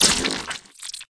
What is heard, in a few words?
beetle dead